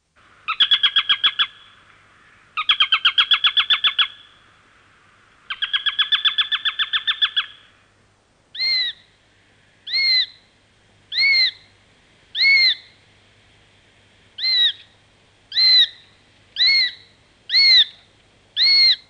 Karvaly; Madarak

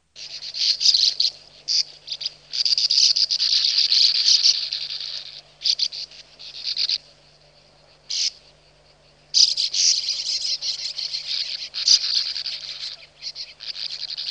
Partifecske; Madarak

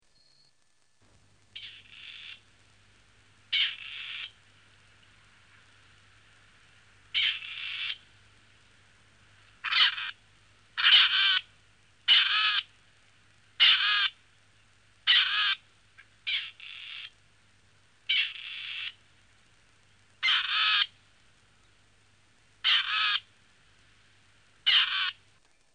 Fogoly; Madarak

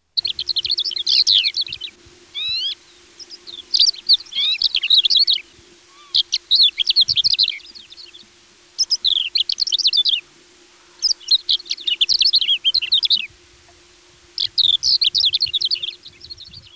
Szikipacsirta; Madarak

